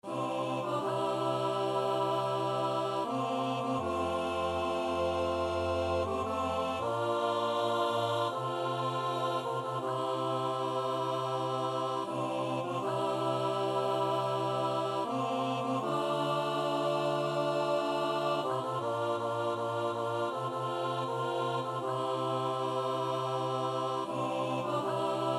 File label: Chorus